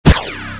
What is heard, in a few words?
flag grab